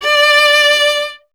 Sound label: viola D; Miroslav Vitous; Solo Instruments VOL-1